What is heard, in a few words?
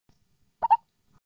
pop up